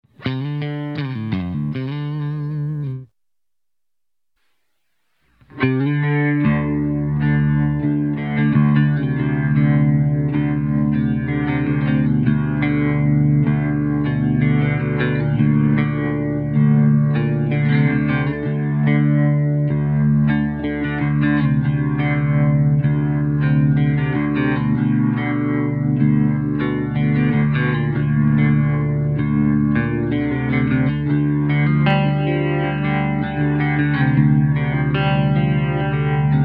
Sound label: Crunchy Wide Horns; Strymon Compadre → Strymon Iridium → Strymon Lex